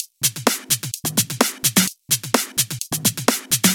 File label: Minimal Loops